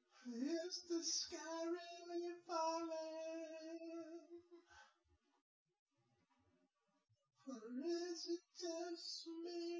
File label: test nodrums synthconv 250ms 5cm sim; SiSEC10; underdetermined